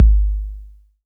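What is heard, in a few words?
bass drum 808 Tape Decay D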